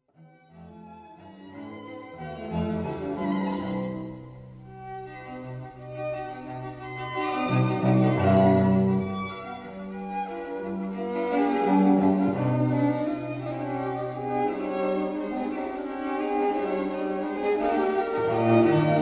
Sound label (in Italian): Menuetto Allegro, pleyel quartett